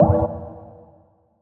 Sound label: SPACE FX